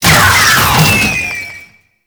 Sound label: Necramech Ranged Hit React; Enemies; Warframe